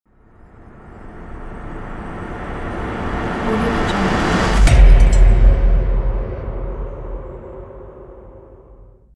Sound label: sub bass impulse